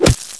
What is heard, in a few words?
strike; hl1mp; XDM